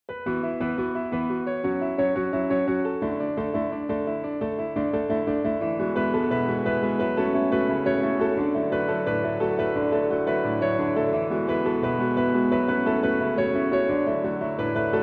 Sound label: Piano